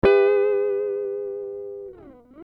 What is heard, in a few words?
Dm 100 GTR Riffs